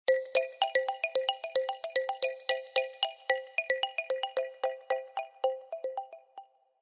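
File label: Blackberry Curve Bell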